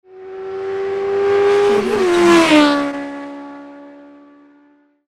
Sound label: motorcycle passing